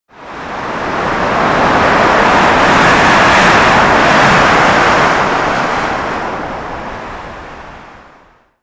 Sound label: mono gust; ambient, ambience, rainscapes, rain; Left 4 Dead 2